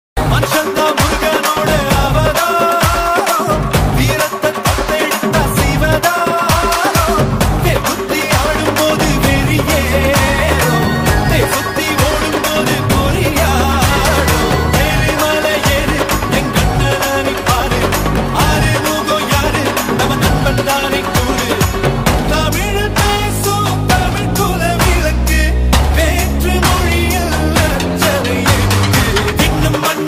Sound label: song ringtone